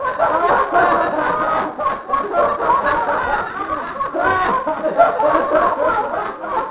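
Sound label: background music result; svencoop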